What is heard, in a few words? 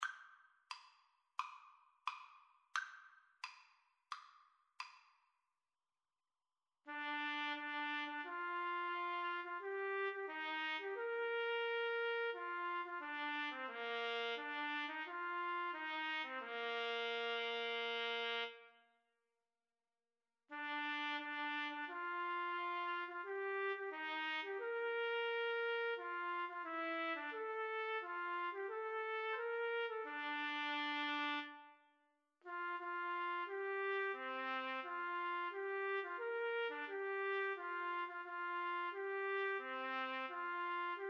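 o holy night, alto saxophone trumpet, Trumpet Saxophone Duet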